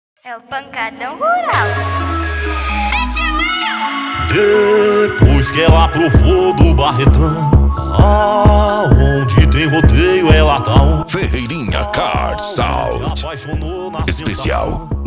lual automotivo; ferreirinha car sound

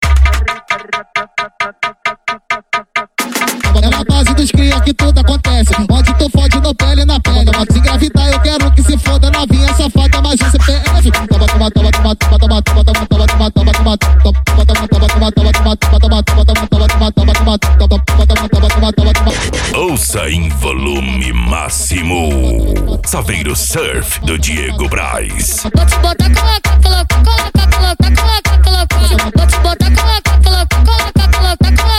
funk consciente e funk ritmado

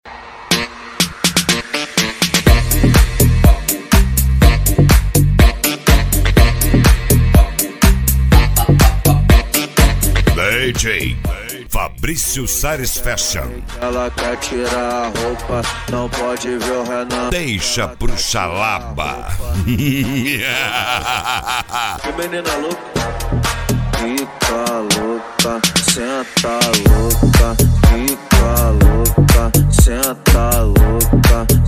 sound car mala aberta e mega funk

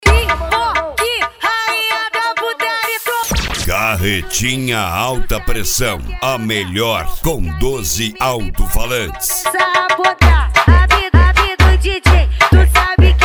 ritimada agressiva

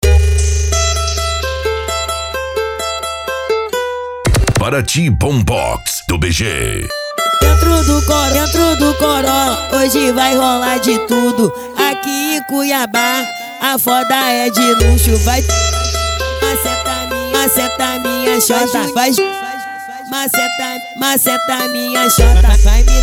bombox funk

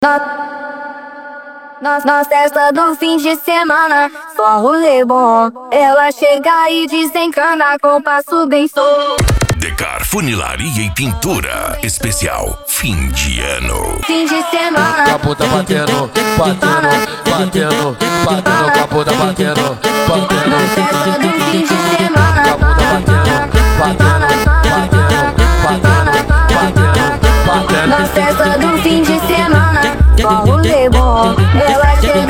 funk ritmado

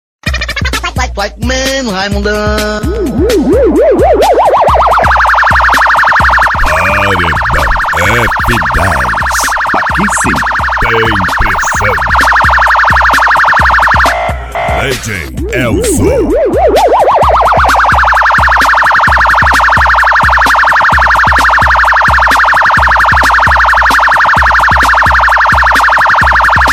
sirene; paredao f 10 so as melhores

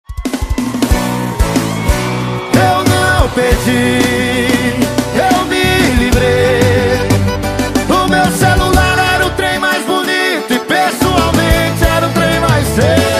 sertanejo com locutores de rodeio

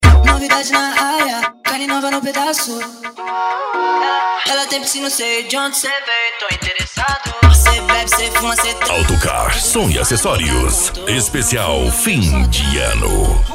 funk agressivo ritmado e funkadao

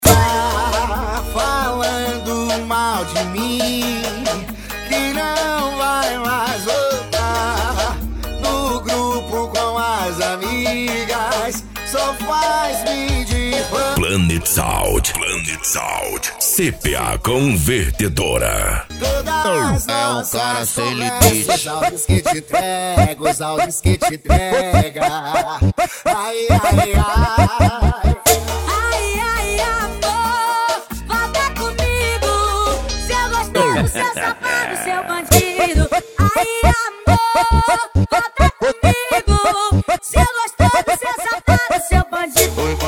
funk ritmado; cuiaba mt

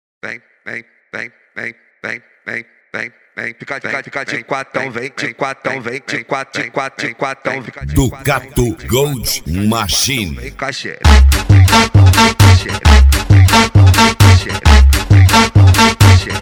especial funk

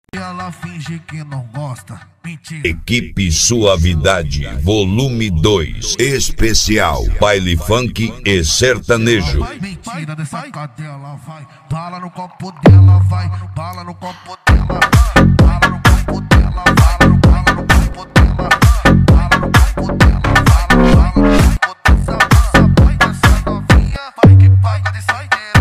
especial baile funk sertanejo

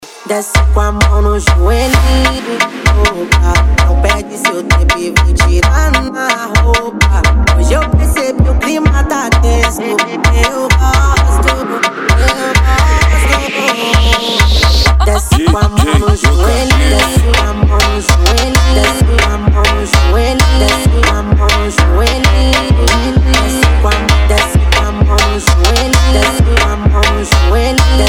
sound car; reboque poseidon sartori

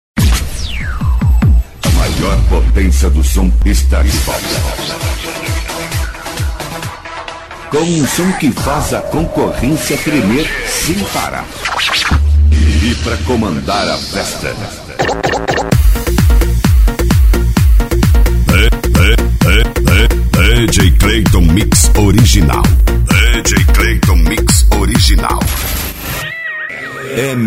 mix sertanejo funk; parati pancadao 2015

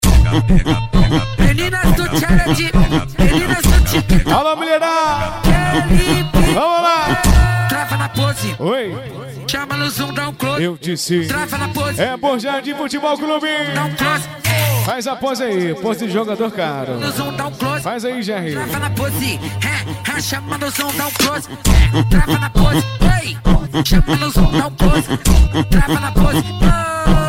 funk pisadinha sertanejo

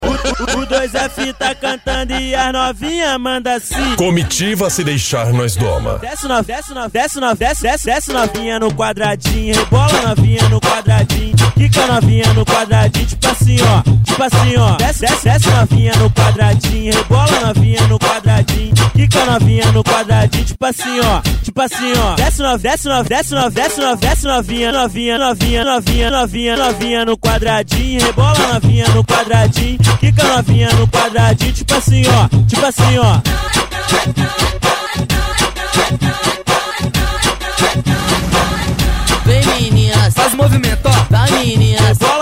funk bass

